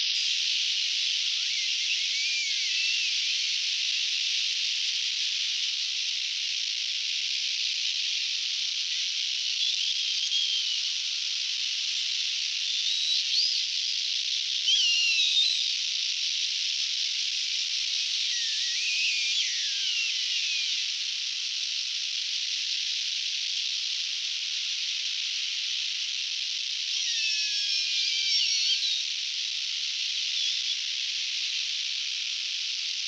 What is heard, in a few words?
Killer Whales